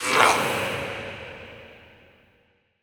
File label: Processed Battle Shouts, Viking Vocal